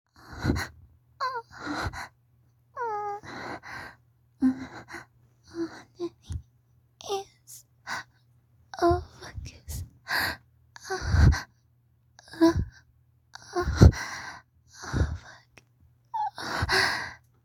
moans; moans for you

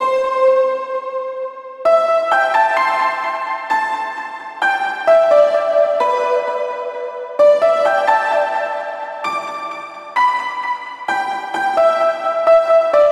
Piano

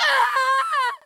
Long Scream

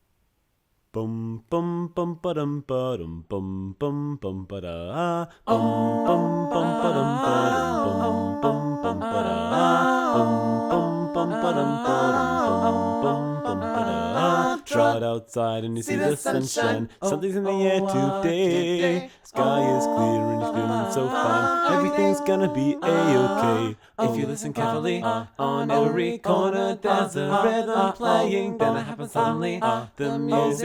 Acapella Cover

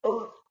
Gag; Lewd SFX

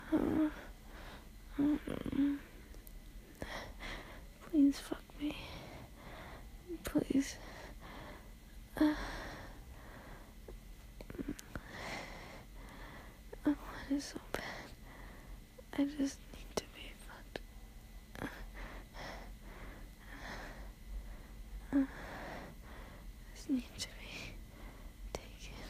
quiet, whimpers, some background noise; F4A; Spur of the Moment Recording

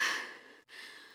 Pant; Lewd SFX

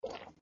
Suck; Lewd SFX

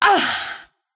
Grunt; Lewd SFX

